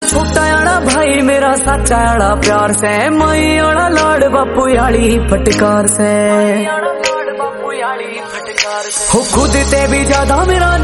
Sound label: brother love haryanvi song